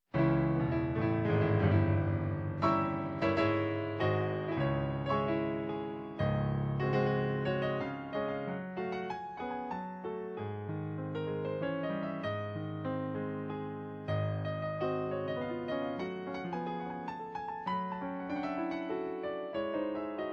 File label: Polonaise No1 practice